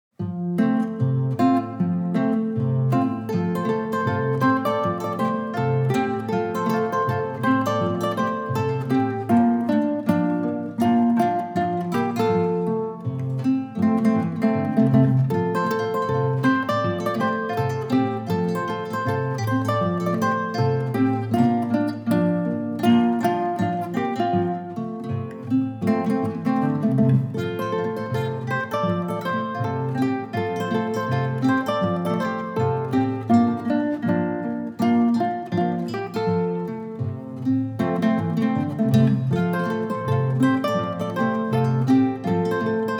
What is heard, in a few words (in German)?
Gitarrenensemble